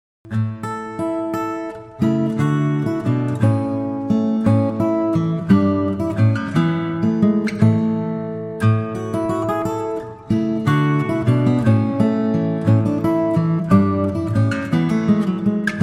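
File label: guitarist, Instrumental Wedding Guitarist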